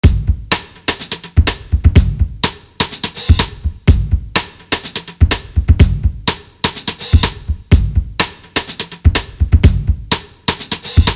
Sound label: Damn Funky, Instrumentals